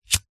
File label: household plastic flint cigarette lighter flick single; zapsplat